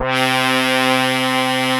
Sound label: THIN LEAD C3, Analog; USB Soundscan vol.49; Analog Fantasies; AKAI; PROPH